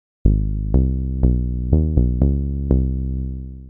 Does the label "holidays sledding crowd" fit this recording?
No